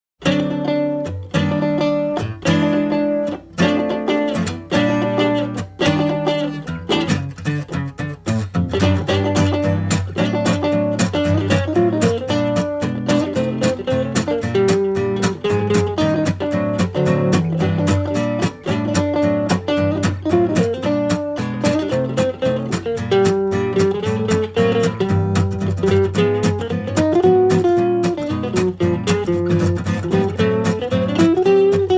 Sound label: Douce Ambiance; SWING GUITAR AND MANDOLIN